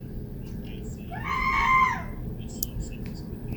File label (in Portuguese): grito